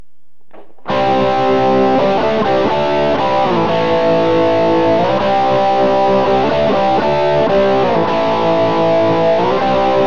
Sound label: bridge crunch; Burny